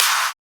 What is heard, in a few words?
Clap